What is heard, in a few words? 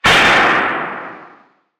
Gun Shot; SoundsCrate